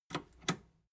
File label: metal button robust click slow